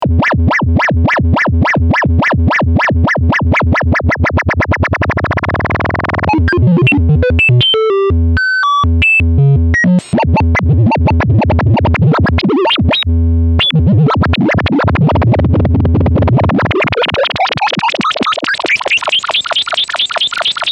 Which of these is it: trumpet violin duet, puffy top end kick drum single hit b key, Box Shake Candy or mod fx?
mod fx